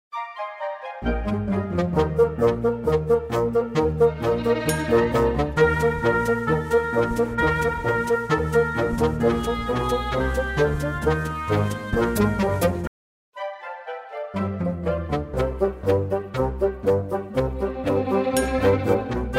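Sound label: instrumental version; przyklady w roznych tempach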